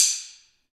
ambient stix, Afro wood; Roland LCDP14 Africa VOL-2

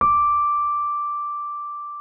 D6, RHODES; Xsample VOL-1

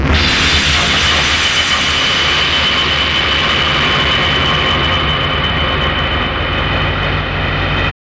Insectus, Hard & Loud Techno

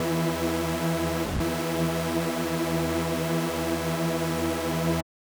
SuperSaw E4; KG MegaSaur; M8

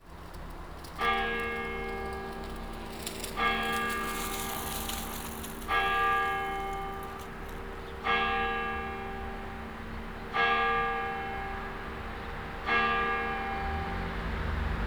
12 O CLOCK, Cathedral Organ; ILIO; Propeller Islands

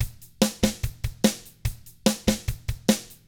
drums, 50s rock; ILIO; Double Platinum Drums 1

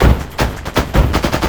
LOOP, 160 BPM, Drum & Bass

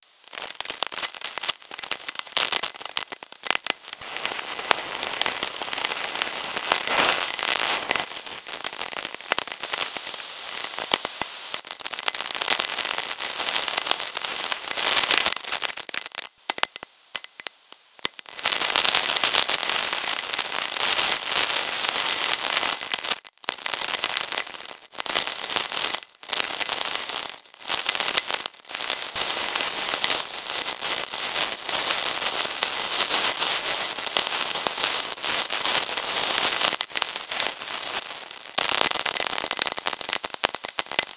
crystal wind; M8; percussion and foley

